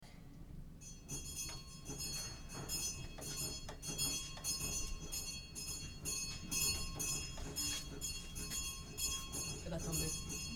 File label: school bell